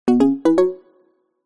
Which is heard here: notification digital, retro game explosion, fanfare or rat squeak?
notification digital